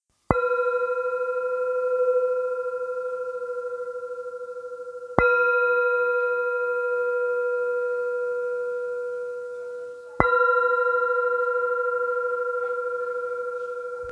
tibetska misa

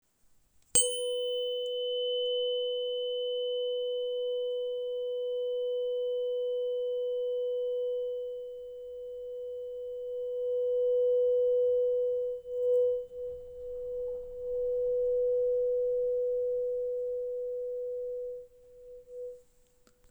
492 8 hz, terapeuticka ladicka 492 8 hz nadledviny hlinik

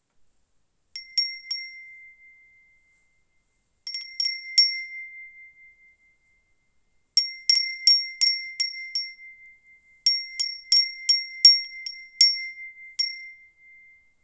furin, zvonkohra skleneny furin bell feng shui; hotaru

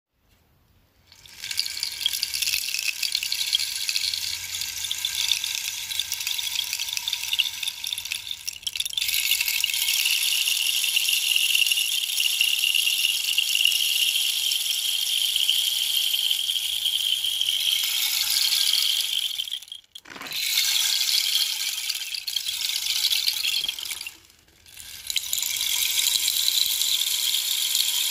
bambus, destna bambusova hul; 60 cm

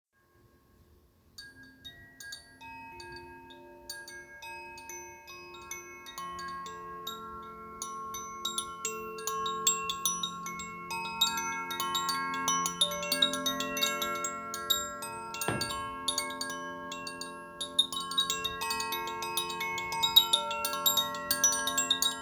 cakra, cakrova zvonkohra solarni cakra

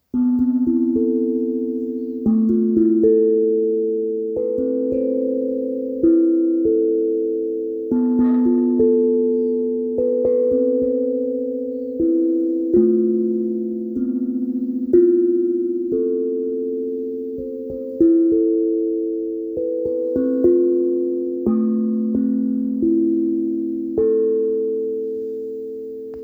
kovový buben Nataraj velký a-moll; akebono

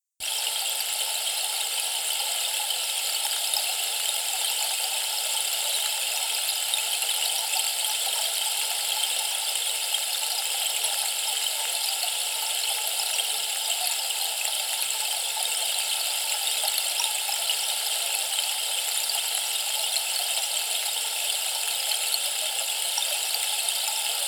olše, harmonizing rain pillar 90 cm alder

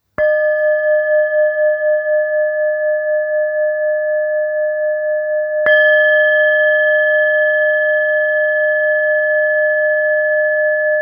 278 chopa, tibetska misa choepa 278 g

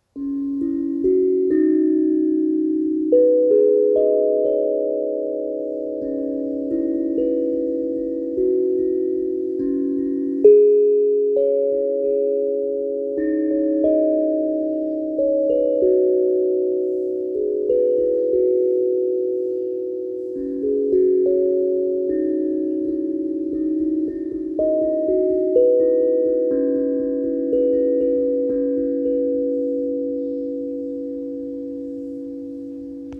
nataraj copper, kovový buben nataraj malý c amara

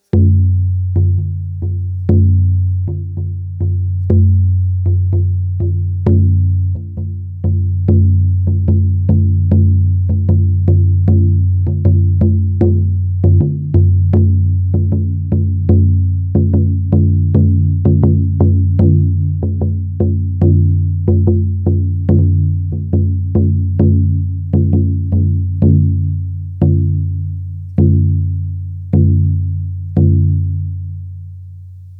samansky buben 45 cm se srsti

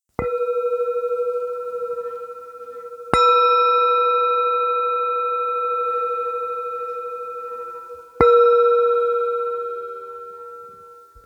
tibetska misa de wa 220 g

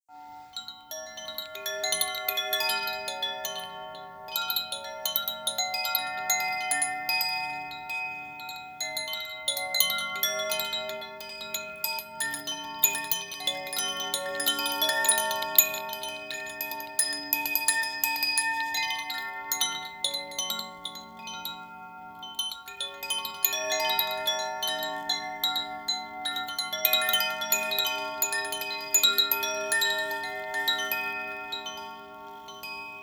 cakrova zvonkohra srdecni 4 cakra

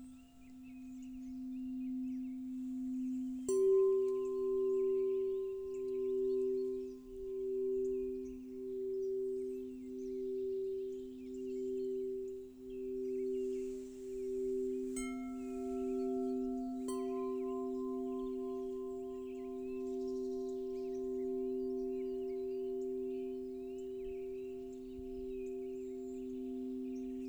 sada zavesnych meditacnich zvonu c a g velke

